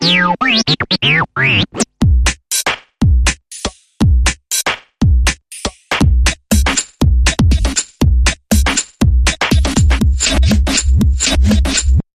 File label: Bot Electronic Bumper